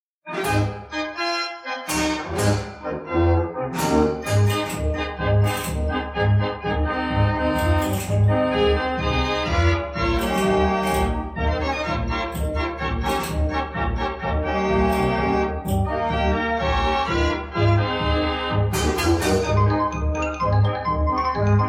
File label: Belgian Band Organ